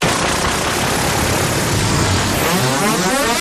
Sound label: glitch pulsing; Pulsing Orbit